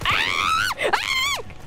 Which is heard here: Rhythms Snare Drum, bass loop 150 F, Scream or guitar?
Scream